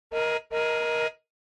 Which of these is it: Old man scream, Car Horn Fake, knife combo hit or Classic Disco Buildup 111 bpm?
Car Horn Fake